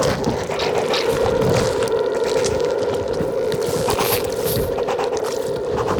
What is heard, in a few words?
Hit Loop; SWARMENEMY